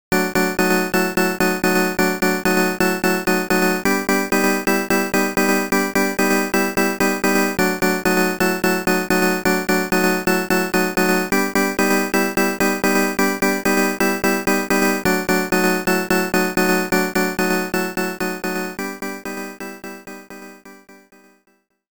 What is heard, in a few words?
Adding bonus music; Libble Rabble